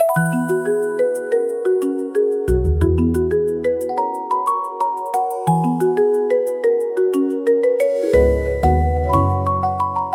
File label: music